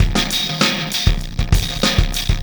System Overload Beat, Processed Drumkits

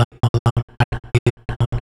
Vox FX; Sonic Foundry (Sony Creative Software); Electrocution

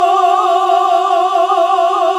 GOSP. AAAHH

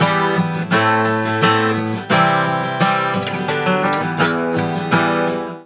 GTR, Celtic Flavours; USB Soundscan vol.42; AKAI

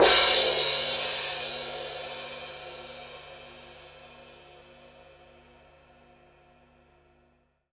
Cym mf, Cymbals, Orchestra; 90 s Sample CDs; PowerFX - Downtown Orchestra

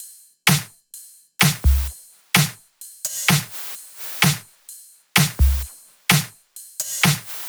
Loops 128BPM, Drumloops

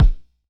Thud Confined, Kicks; Airwindows

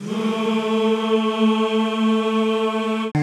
CHO A2; Sound & Vision; Gigapack I CD 2; CHO Zzzz + Vvvv; CHO Vvvvv

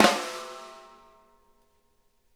flam, snare; Sonic Reality; Interactive Drum Kits

